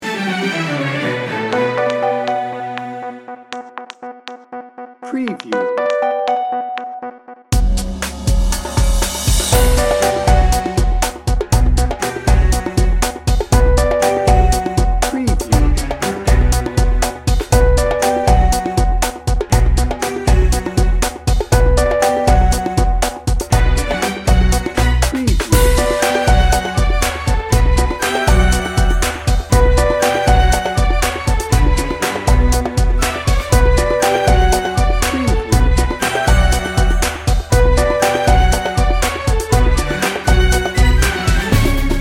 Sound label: bollywood temple dance no guitar